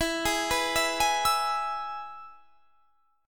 E-Major E; arpeggiated; 24,23,21,21,21,24

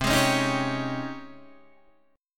G sharp Major 11th C

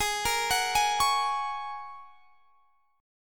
G sharp 7th Suspended 2nd Suspended 4th G sharp; G#7sus2sus4; x,23,20,23,21,21; arpeggiated

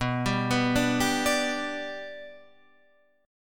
B-Minor Sharp 5th

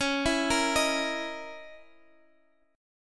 Db Minor 6th Add 9th, Guitar, Half Step Down

